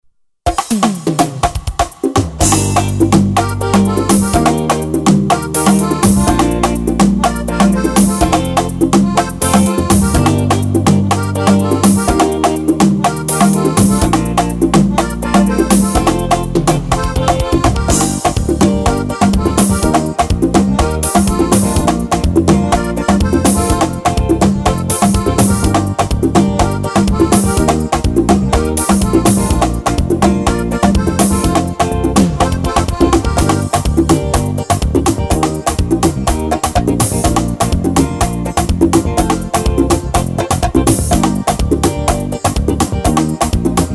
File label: forro arroxe bahia